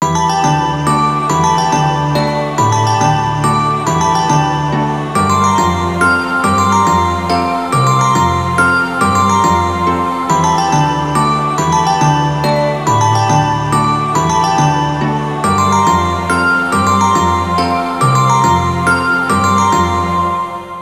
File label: Lullaby; Bella